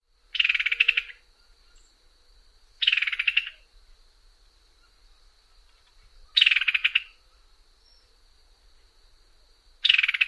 chatter calls

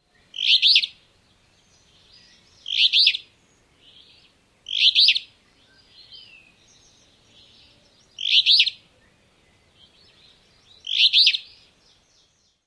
song

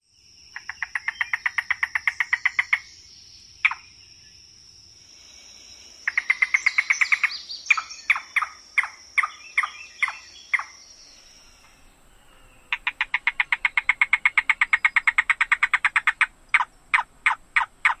c-c-c-kowlp outbursts 3 examples

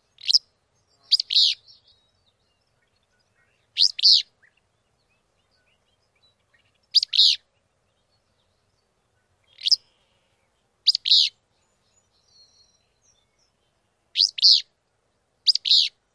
song

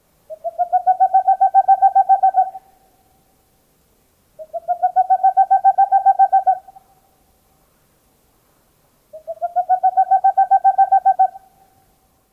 tooting call

